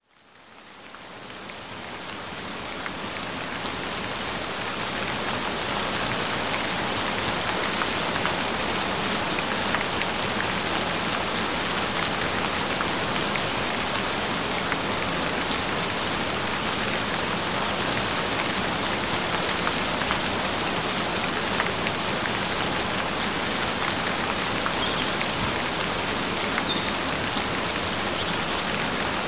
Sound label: Gentle Rain Sounds